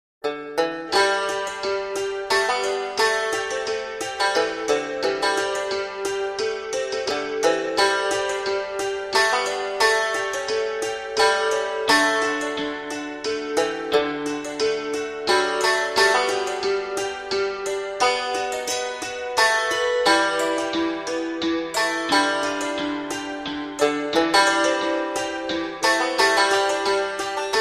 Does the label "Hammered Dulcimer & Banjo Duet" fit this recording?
Yes